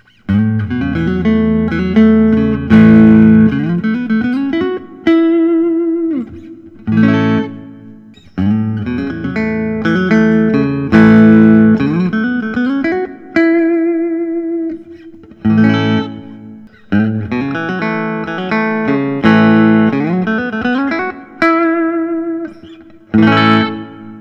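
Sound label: fender custom shop 60 custom telecaster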